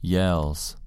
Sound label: yells